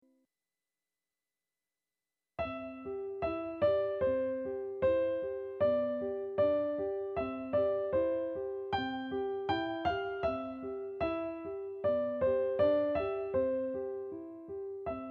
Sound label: Go Tell Aunt Rhody alberti bass